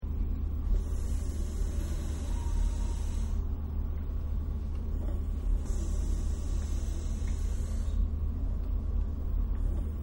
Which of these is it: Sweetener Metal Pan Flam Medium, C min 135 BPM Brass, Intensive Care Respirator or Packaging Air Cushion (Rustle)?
Intensive Care Respirator